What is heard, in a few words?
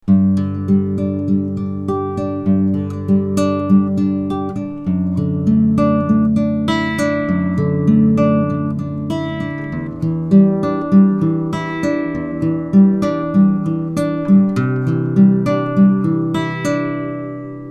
guitar plucking